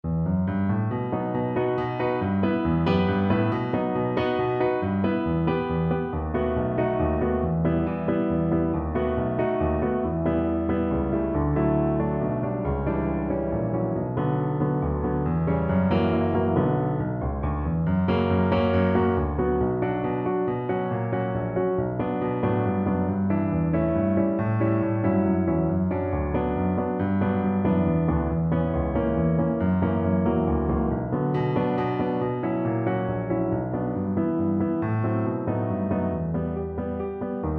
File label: accomp, e min